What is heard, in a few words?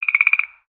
Frog Hatchling Croak; creatures organic